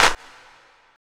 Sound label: CLP, Disco Fever drum samples; Best Service ProSamples vol.48 - Disco Fever; AKAI